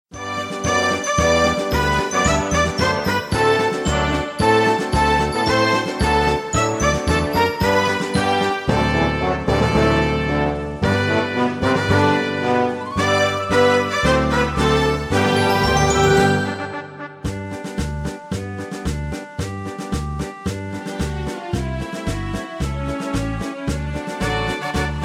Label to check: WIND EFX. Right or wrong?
Wrong